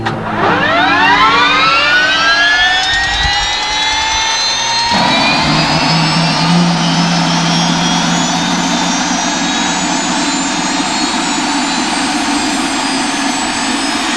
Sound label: t63 start - low idle